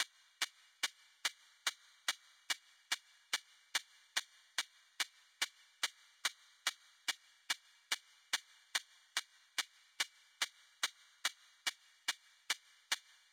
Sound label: Vendor Music Hihat; laser kitty pow pow switch gamerip 2019